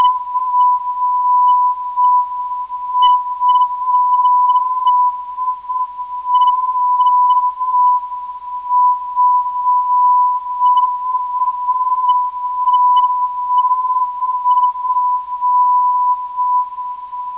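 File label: non-directional beacon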